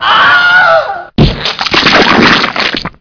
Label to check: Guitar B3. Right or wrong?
Wrong